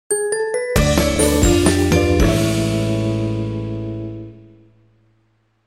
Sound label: Round Over; Everybody's Golf soundtrack